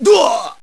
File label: duwaah